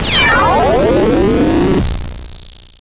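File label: FX right, attacks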